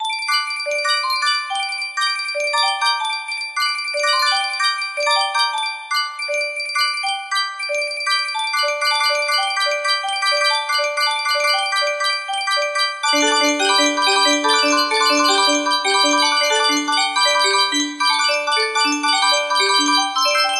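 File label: music box melody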